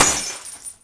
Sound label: glass; Mafie 1